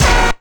Ragga & Dub Reggae HIT; USB Soundscan vol.27; AKAI

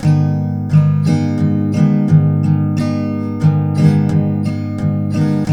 TK MIN A, Guitars; Spectrasonics; Hans Zimmer Guitars VOL-1

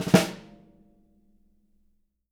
snare ruffs, JZ XSNARES

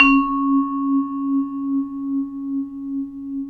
VIBRAPHON; ILIO

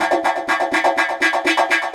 Echo Chuff, Synthetic Pulses

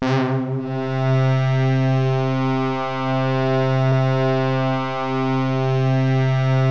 SYNTH HORNS, ENSONIQ VFX; Rubber Chicken Software Sample Bank

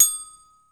D#4 glock; InVision Interactive; Lightware VOL-1; Instruments & Percussions; GLOCKENSPIEL

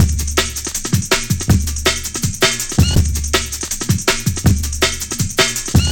Raw, Loops, breaks; m8 breaks

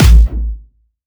Distortion Kicks, Bassdrums

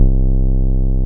BAS MKS-50; Best Service Gigapack 2

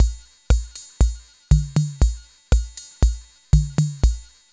SWING; INIS; Funky Xtreams

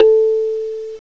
KALIM, MALLETS; CMI Sound Library Rev 1.3

